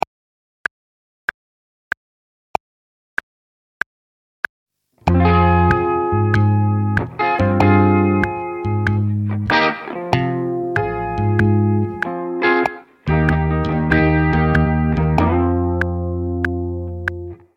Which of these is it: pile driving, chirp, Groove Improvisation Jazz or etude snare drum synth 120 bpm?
Groove Improvisation Jazz